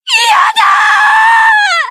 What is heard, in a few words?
screaming